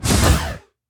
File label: open fast, doors futuristic; dragonroll